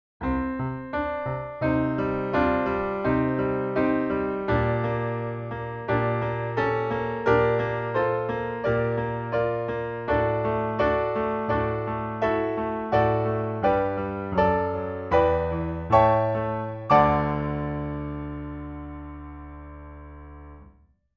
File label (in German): Kurzer Aufstieg Klavier